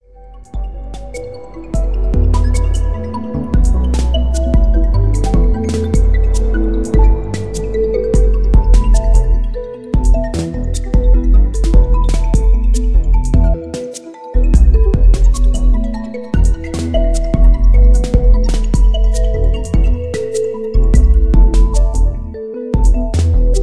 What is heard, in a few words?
Ambiental Loop